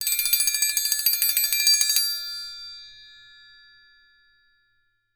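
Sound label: TRIANGLE, BELLS CHIMES; Voice Crystal - Steve Reid Definitive Percussion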